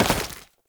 Step Goo; Warframe; Oraxia; Foley

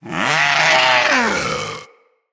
Vulpaphyla Vocal Death, Hunting Critters; Characters

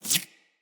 Root Spider Web Shot Proj Whiz; Animals; Spiders; Abilities

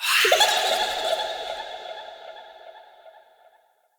Hallow Spirit Vocal Death; Halloween

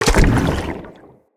Staff Caster Proj Destroy; Weapons; Staves; Dendrit Staff Caster

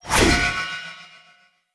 Short Sword Throw Perfect; Weapons; Player

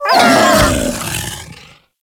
Kubrow Feral Vocal Death; Enemies; Warframe

